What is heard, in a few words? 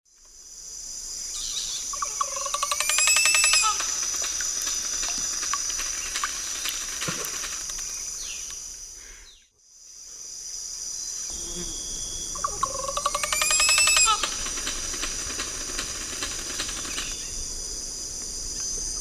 Psarocolius decumanus, display song; S. America; Eastern Lowlands